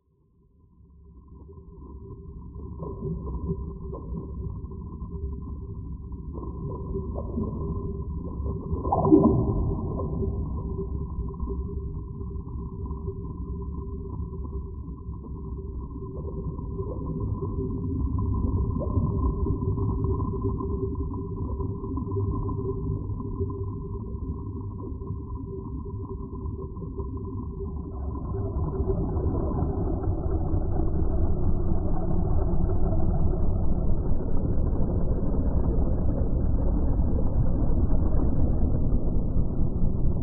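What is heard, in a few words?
Underwater background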